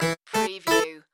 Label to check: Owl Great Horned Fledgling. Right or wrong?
Wrong